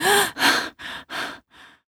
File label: voc gasp female; oblivion; Realistic Fatigue